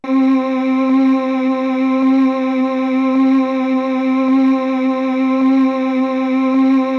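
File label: synth C1, Deepwater Sarrar, YAMAHA TX16W; Best Service ProSamples vol.55 - Retro Sampler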